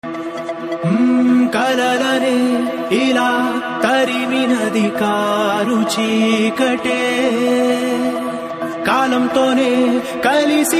Ringtone

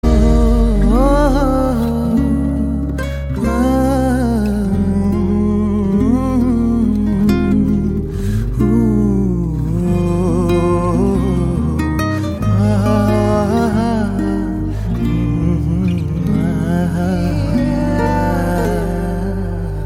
humming

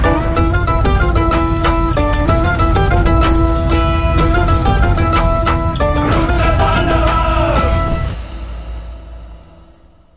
Trailer Bgm; Rudra Thaandavam

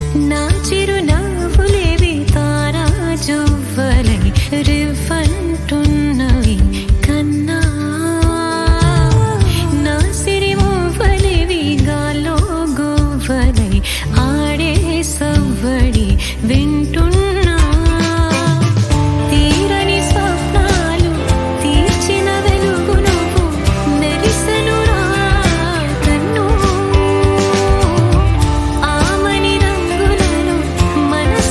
Female; Raja Vikramarka

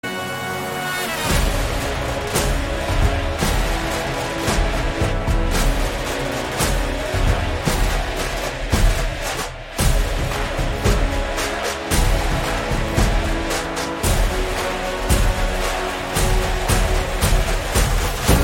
Opening Song BGM

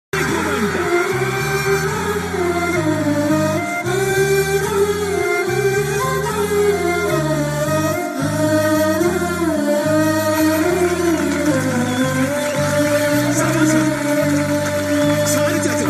Emotional Bgm Ringtone; Kanaa

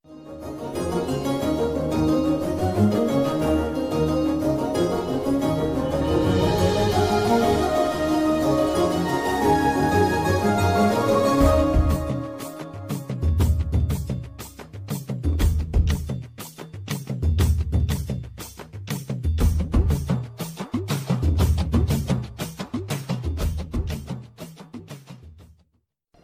Happy End BGM

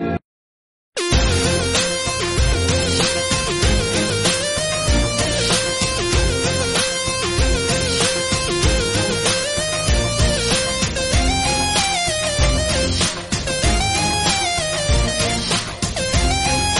Sad Bgm

